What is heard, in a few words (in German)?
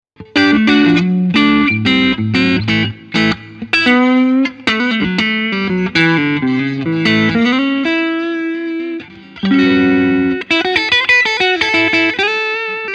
Yamaha Pacifica Standard Plus clean Hals